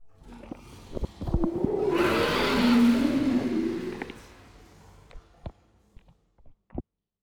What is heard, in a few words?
aqudragon reanimated death; Stellaris